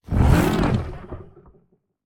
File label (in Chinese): 愤怒聆听声; 监守者